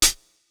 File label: Hihat Closed